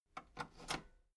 metal lever button radio enable slow

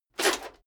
metal plate armor movement single

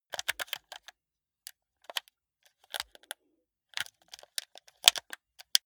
console controller play sequence normal

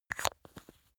glass bottle close

